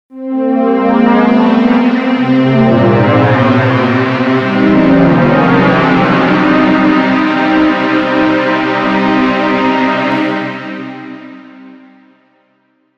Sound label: Alarm